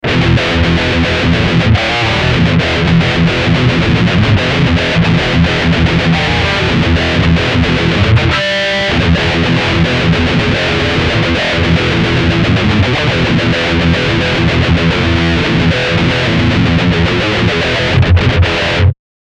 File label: Modern Metal